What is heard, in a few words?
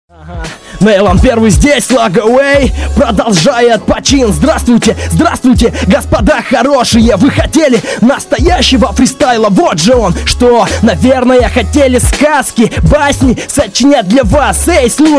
nylon, freestyle